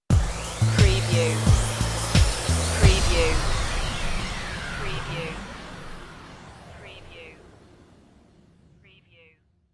falling blossom stinger

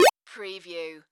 Video Game Jump Play; sound effects bible

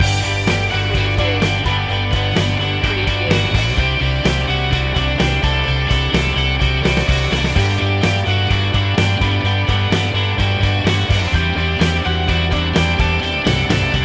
driving force loop

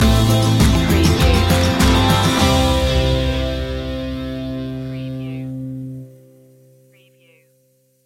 see you smile stinger

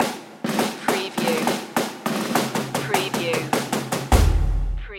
tattoo drumline

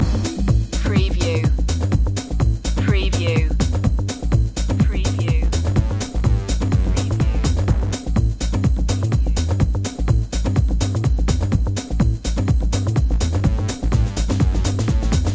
pulse line loop

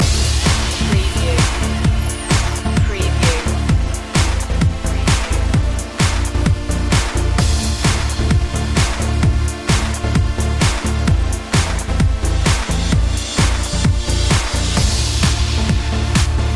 laserbeam loop

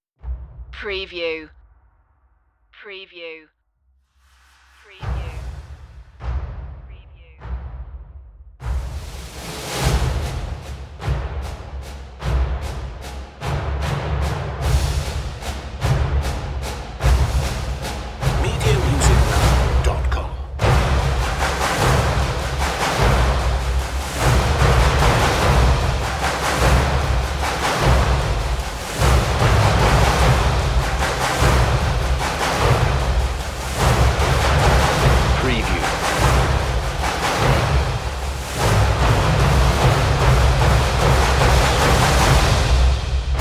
angels demons perc

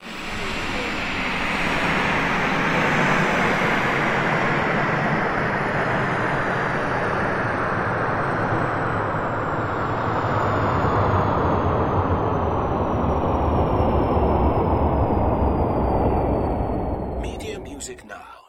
Spaceship Land

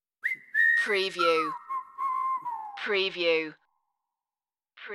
hickabilly stinger

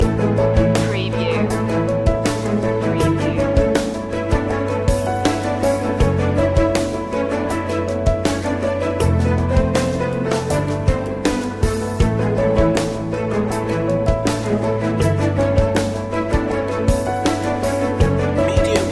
building success loop

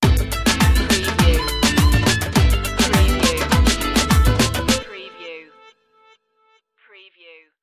global flamenco sting

